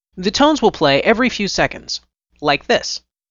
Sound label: Tones Play